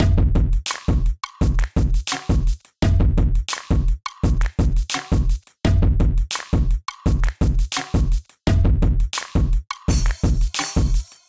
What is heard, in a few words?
Kit, Clips; Kindified Kit; Ableton; Bomblastic